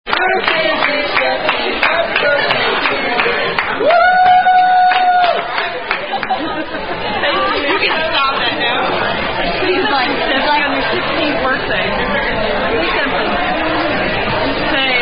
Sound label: Happy Birthday (Standard) Sung By Wait Staff; Chili's